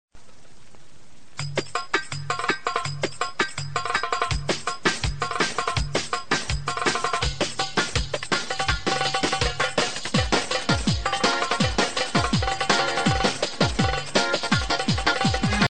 Arabic Festival Ringtone

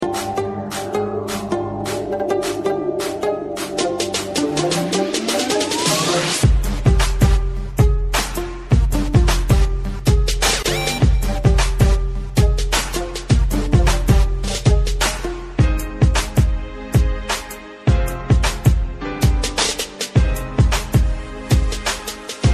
Arabic Tone

